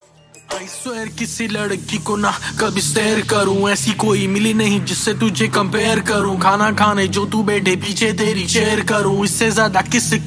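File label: Rap background music ringtone; Chhallang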